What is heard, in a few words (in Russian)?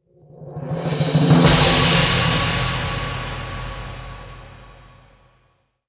zvuk provala